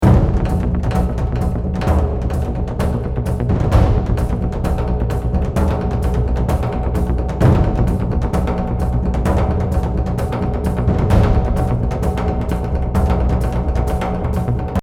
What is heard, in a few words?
Battle Loop; Infinity Blade 2